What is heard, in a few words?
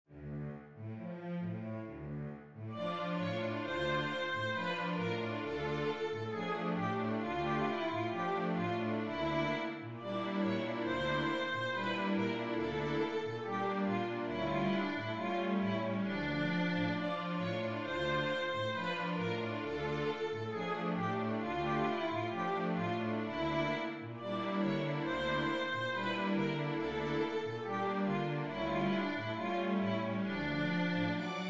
habanera full